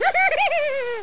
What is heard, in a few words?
giggle; Spanish Net; HALLWAY